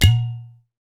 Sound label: Udu Pot Acc, percussion; Roland BK-5